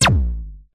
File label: Laser-Like Synth Basic Laser; Sound Spark